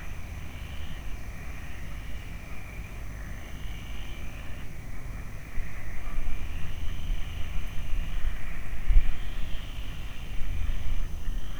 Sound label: frogs